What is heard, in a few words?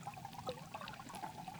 Waterway D Dark